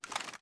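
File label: weapon foley assault rifle inspect; gmod; viper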